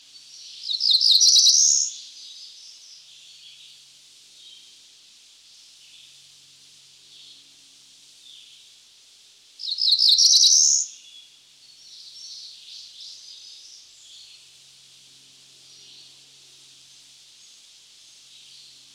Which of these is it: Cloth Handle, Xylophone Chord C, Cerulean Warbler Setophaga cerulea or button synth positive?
Cerulean Warbler Setophaga cerulea